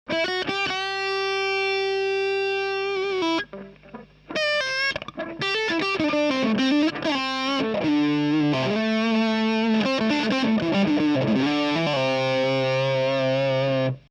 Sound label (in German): HB steg Zerre